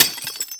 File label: punch glass